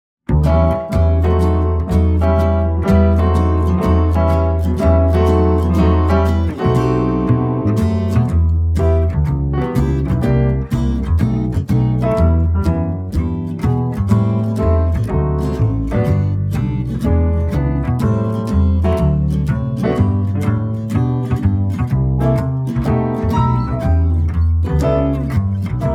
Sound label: Karaoke; Vokalausbildung